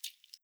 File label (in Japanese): しぶき 短, ぴゅっ 短め